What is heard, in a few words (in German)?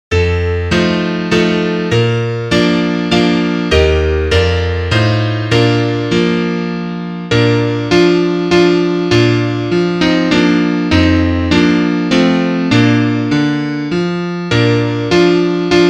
Klavier Solo, trompete; Heissa Kathreinerle (Duett)